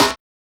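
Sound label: HSE-SD, house snare drum; X-Static Goldmine 1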